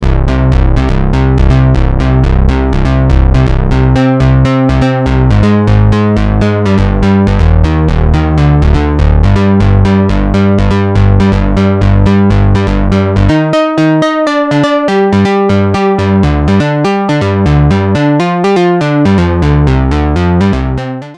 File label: waldorf pulse